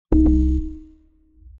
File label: wrong